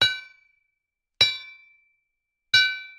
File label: anvil; ambient